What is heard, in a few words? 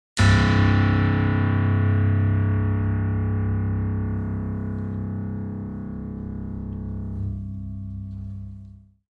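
Grand Piano Strings Deep; keyboards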